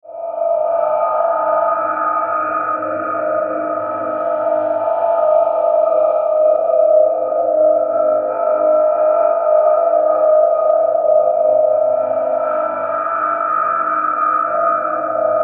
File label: Drone Dark Eerie Swell; Drone Dark Eerie Filtered Swell; Blastwave